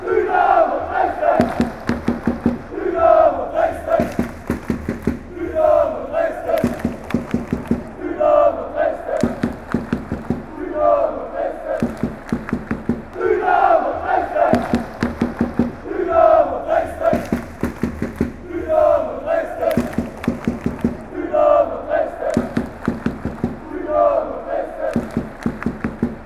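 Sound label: fanchants, football chants; dresden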